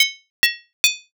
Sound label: dishes